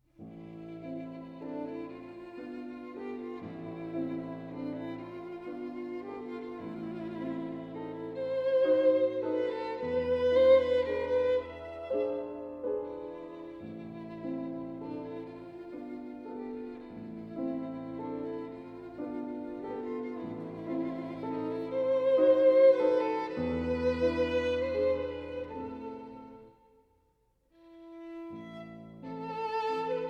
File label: Waltz